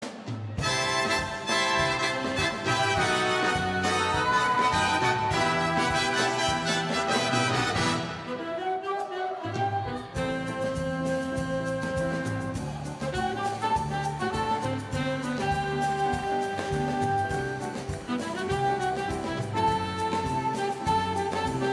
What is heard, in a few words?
samba; blackpool